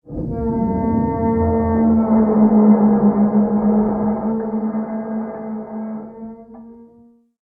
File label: Amb Constructions; Red Orchestra Ostfront 41-45